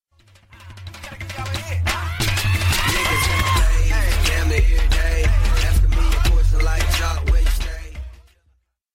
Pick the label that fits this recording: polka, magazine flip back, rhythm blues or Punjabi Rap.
Punjabi Rap